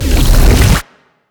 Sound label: poison warn